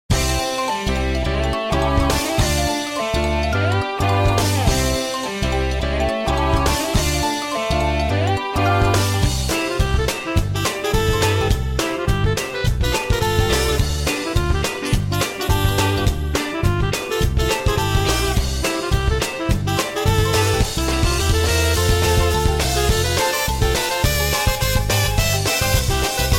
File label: Athletic BGM; NSMB